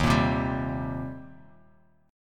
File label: Eb 6th Eb down; Eb6 strummed; JGuitar